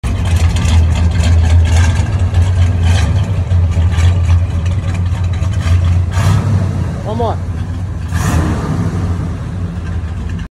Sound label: oldsmobile; آمریکایی; الدزمبیل آمریکایی